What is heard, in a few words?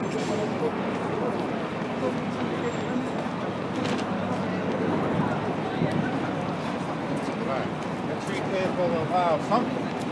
5th Ave Walk